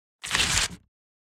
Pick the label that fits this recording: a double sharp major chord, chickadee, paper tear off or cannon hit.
paper tear off